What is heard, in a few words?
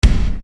Bass Dr, EuroDance Bdrums